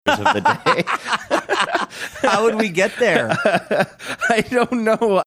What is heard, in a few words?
laughing saying how would we get there